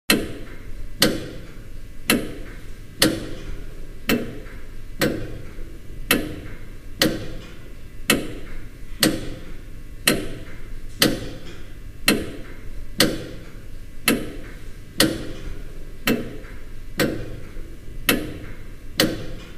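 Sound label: Clock, Tower, 19th C, Ticks; Large Clock Tower Ticks Constant, Heavy Iron Mechanism, Roomy Ambience; Clock 10; Large Wovik Heinz Clock Tower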